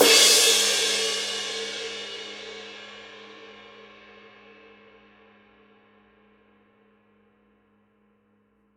PAISTE TWENTY MASTER MEDIUM RIDE

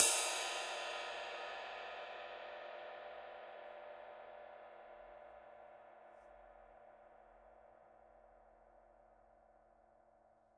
PAISTE TWENTY MASTER DARK CRISP RIDE

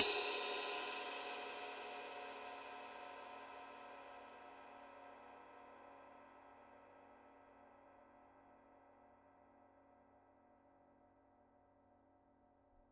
PAISTE TWENTY MASTER DEEP SIGNATURE GROOVE RIDE

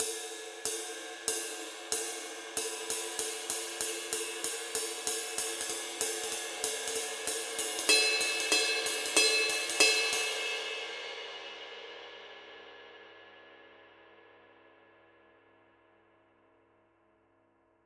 PAISTE TWENTY MASTER MEDIUM RIDE

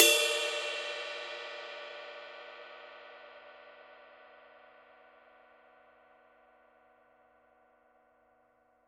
PAISTE TWENTY MASTER DARK CRISP RIDE

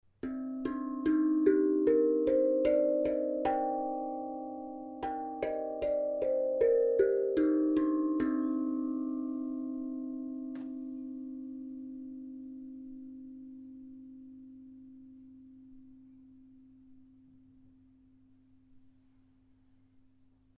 ZENKO ZEN07 PLAINSTEEL

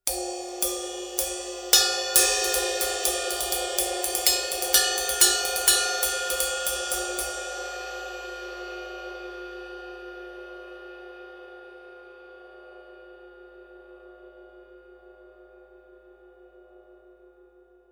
RIDE SABIAN B8 PRO ROCK

